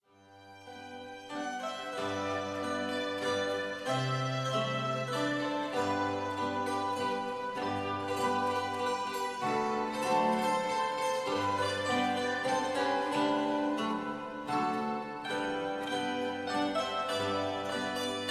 Menuett Stubenmusik

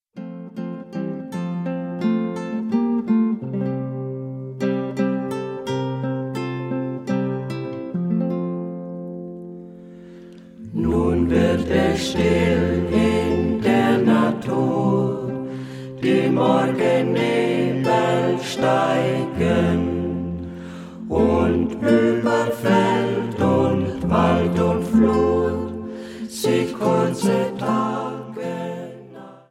Saenger; Nun wird es still

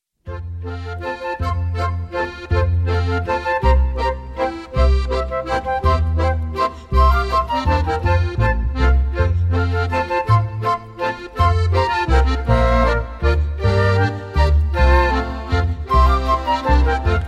Walzer, Volksmusik

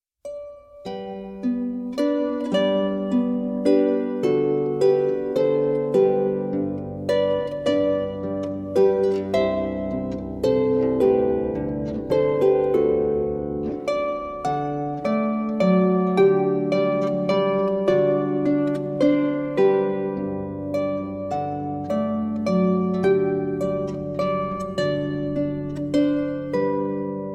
Festliches Allegretto

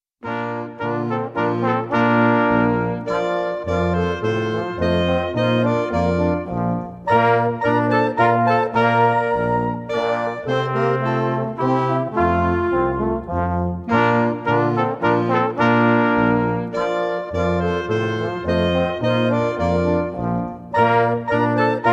Menuett

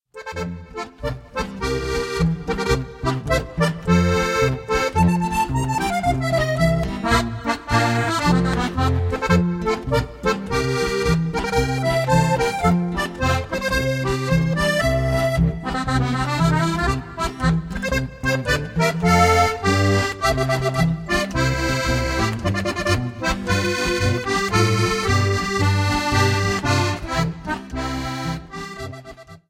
Hausmusik, volksmusik oberfranken; Am Ochsenkopf

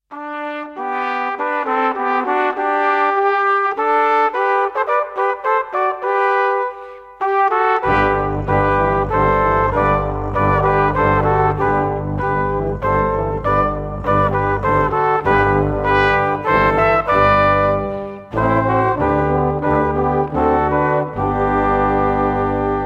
Turmblaeser

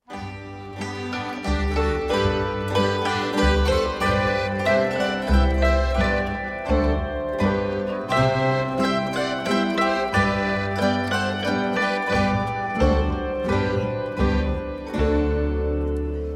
Menuett Stubenmusik

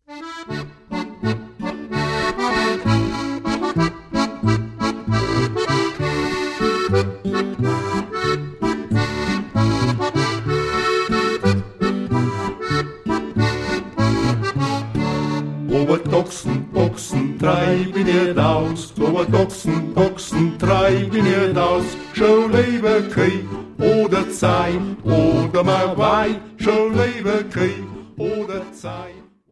gesungener Zwiefacher; Aber d Ochsn

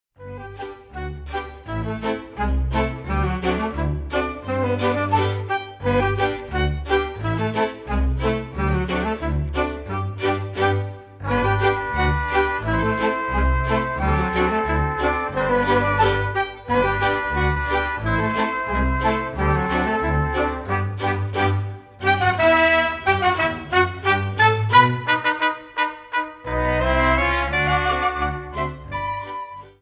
Polka

